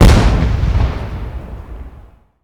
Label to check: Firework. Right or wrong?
Right